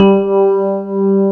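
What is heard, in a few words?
G2 electric piano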